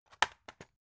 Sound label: drug package drop deep; arzt